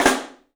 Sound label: PICSNF, 3D Drums, PICOLO FLAM; 3D Sound